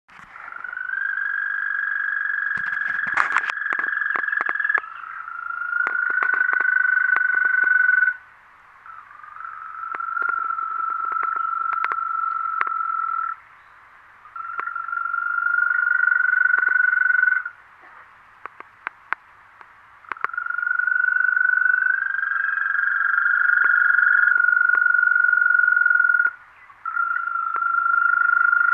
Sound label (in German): rufe wechselkroete